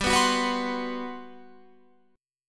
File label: G Major 11th, Guitar Open F; Listen to GM11 strummed; GM11 Chord